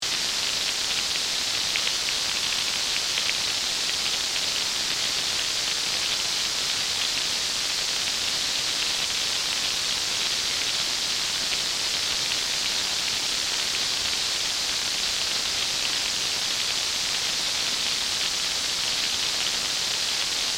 Transmission Static, Scifi, Transmission, Static, Radio, Science, Fiction; Communication Sound Effects; BlastWave